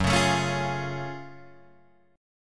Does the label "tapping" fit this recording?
No